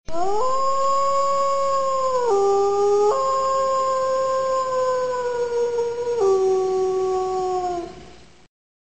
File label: Wolf Howling Ringtone